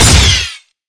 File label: pistol shoot; weapons